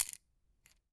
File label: construction tool